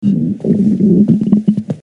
Gargouilli ventre

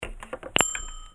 Raccrochage vieux téléphone

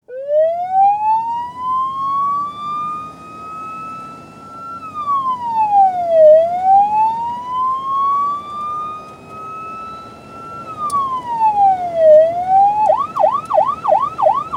Sirène d'ambulance

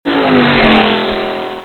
Moto à gde vitesse

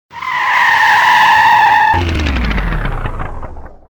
Freinage + terminage

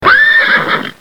Cheval huhu, Animaux; bruitage

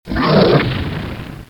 Alien

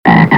Grenouille, Animaux; bruitage

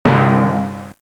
Timbales; bruitage; Instruments muzik

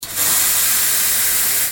Machine à café; bruitage; Electro-menager

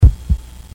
Coeur battement; bruitage

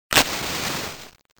Allumette; bruitage; Electro-menager

